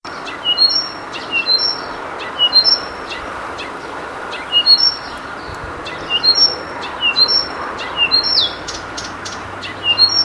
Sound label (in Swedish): Busksangare; Halland